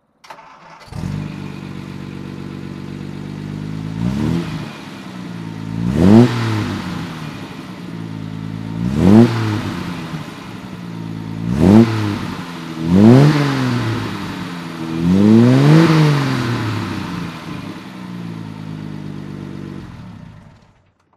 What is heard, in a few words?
jaguar xk120 fhc throttle; Jaguar XK 120 Fixed Head Coupe, 1954; Engine sound